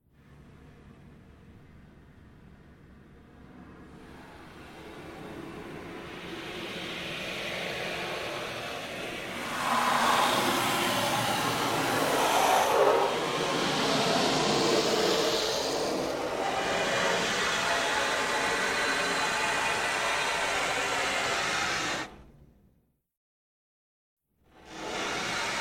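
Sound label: Water Spray interior Car Around Soft Long; Car Wash; Spraying; Sound Effects:Objects; Big Room Sound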